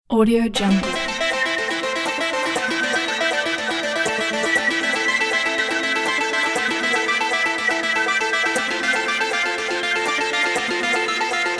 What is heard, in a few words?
space flight disco